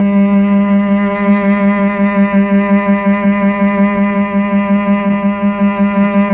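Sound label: Mellotron Cello G4, Slow Cello; Keyboards of The 60's and 70's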